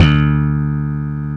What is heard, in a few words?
DX7 Slap, baesse